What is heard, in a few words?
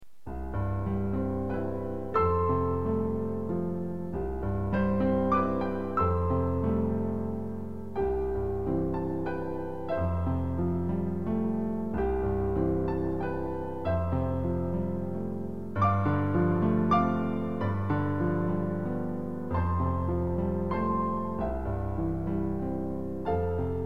Silent Night piano duet